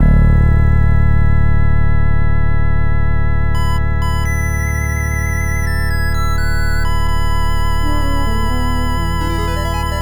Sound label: Jingle [Class Change Jingle]; Tenshoku - Atarashii Ippo; Shining Force Genesis